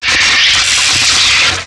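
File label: Laser Fire Interior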